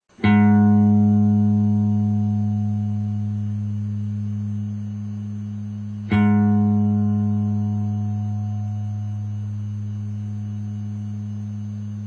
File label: acoustic tune up 5th, guitar